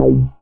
DM BAS, Dance Mega Synth

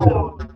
Korg, FX

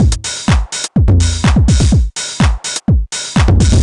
drum loop tech full 125; Classic Chicago House; Sample Magic

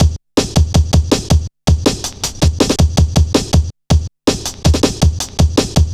Boy, Drum Loops, Jungle, 161.5

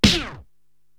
DJ Record Stop

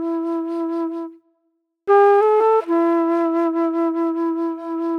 Pop Flute

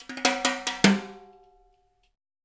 TIMBALE; Spectrasonics; Liquid Grooves 1 & 2

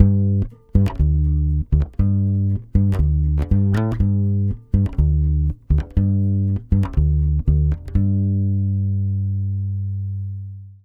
120 BASS G, Brazil Bossa; USB Soundscan; AKAI

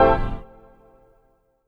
CHORDS; EastWest; Dance Mega Hip Hop Electro

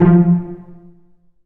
Cel Pz F1; Digital Sound Factory Virtuoso

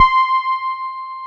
Korg POLY800 C5; Back In Time Records; Korg Universe VOL-1

